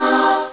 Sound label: choir min